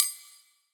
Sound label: Kitchen Foley FX Drums